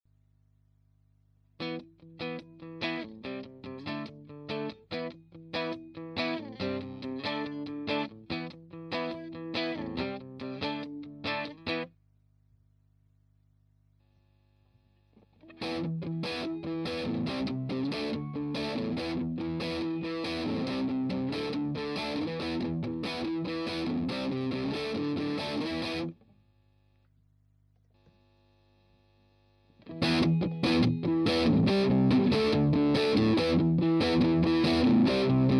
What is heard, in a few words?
boss ds 1 mod; DS-1 Sheepdip and Drench Mod; Recorded on Strat, Middle Pick Up though Vox AC-15 Low Volume; Clean, Stock Boss Distortion DS-1, Sheepdip Mod, Drench Mod; Dexter Mod Pedals